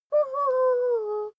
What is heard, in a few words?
child laugh fake